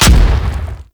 Thumper Bot Step Heavy; Warframe; Grineer; Movement; Steps

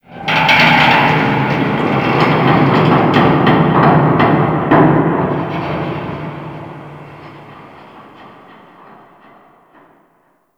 Shipyards Distant Metal Groan, Ambience

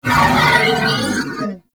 Horse Vocal Screech Jump; Vehicles; Warframe; Duviri

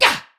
Attack Effort; Warframe; Tau; Dax; Ninja

